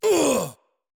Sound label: Death Vo; portal quest android; Antihero